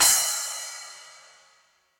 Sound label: Splash 10 Room With A Vista, Cymbal; Drum Hits; Standard Hits; Ableton; Factory Packs; Session Drums Studio